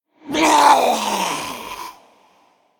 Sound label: Male Old God Creature Death